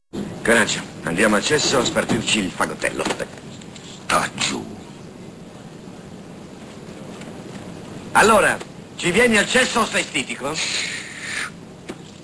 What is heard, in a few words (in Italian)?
Fagottello